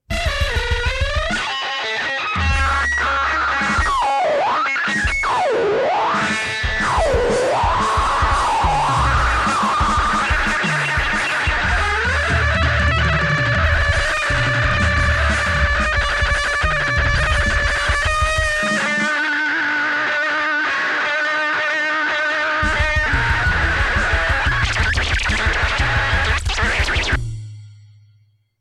overly busy drum machine left, noisy guitar sounds right